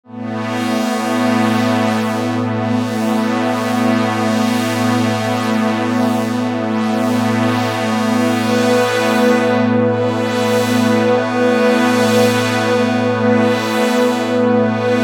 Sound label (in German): Poly Pad ohne FX; Cherry Audio PS-3300